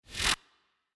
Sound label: Unlock Mode